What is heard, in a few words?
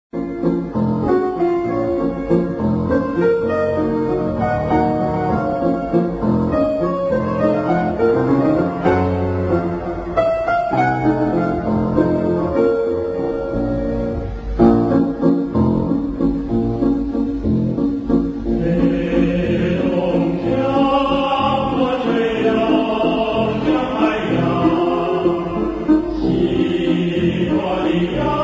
chorus; amurwaves